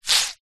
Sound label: wet cloth squeeze rinse; foley; zapsplat